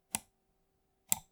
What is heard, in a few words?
Mouse Button Long Click